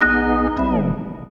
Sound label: Organ Lick; Ambient Grooves; Organs Licks